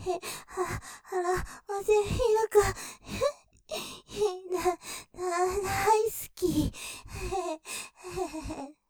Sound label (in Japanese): 荒い息で; 余韻◆荒い息で、孕ませミルク大好き☆; ステレオ